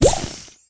Bottle

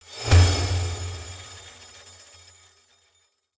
Hud Village Build; X360; From Dust

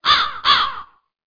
crossbow eagle; Kingdom Rush Frontiers

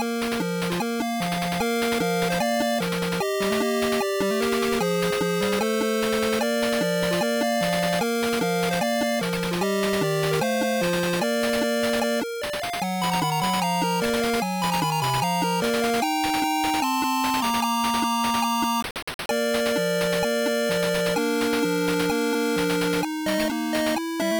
Treasure found; Pitfall II Lost Caverns; IBM PCjr

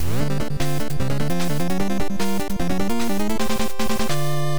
Stage Clear; Shadow of the Ninja OST 1990 NES

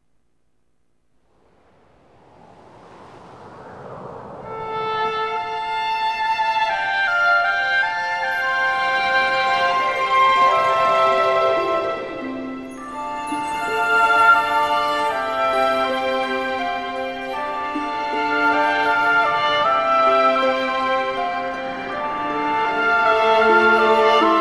Plays The Harp; the legend of zelda skyward sword wii gamerip 2011; Link Plays The Harp

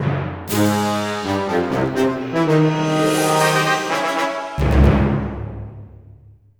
Boss Squash Outro Win

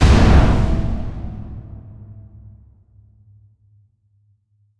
hunt fail

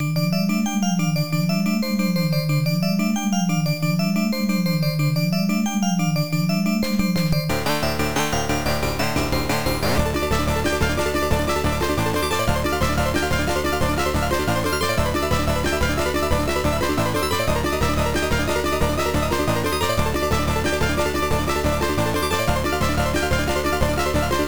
Moan (Final Boss)

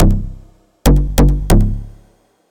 Hard Bass Simple

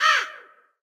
Crows Nest; Dark Army Archer

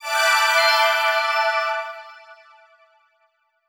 Jingle Win; Super Monkey Ball Banana Splitz; PS Vita

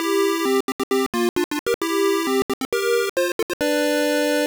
Game Start; Hyper Sports; arcade

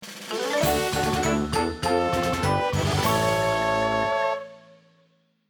victory music; Backyard Heroes; android game music

